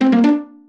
Pizzicato jingles; music jingles; kenney

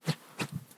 Whistle, Organic Wooshes; Micro Pack

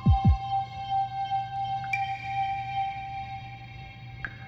Fuzzy Pads; BB 2HTC Samples Vol 4

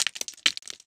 rock breaking

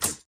sci-fi sfx

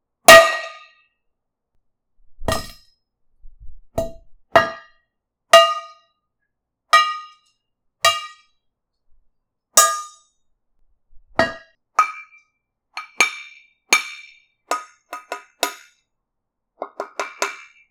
pots and pans clean

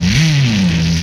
Rev, Retro Arcade Sounds, Vehicles

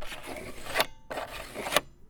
Smooth Texture; BB Retail Therapy Sample Pack

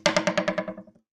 spring

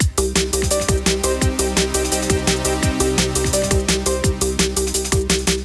DnB, Loops; BB 2HTC Samples Vol 4 Addendum

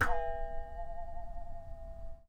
Wop, Japanese pull saw

